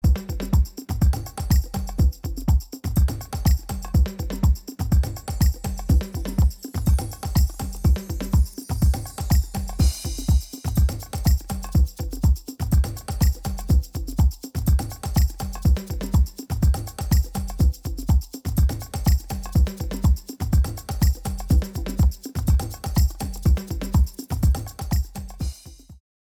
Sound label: Drum Example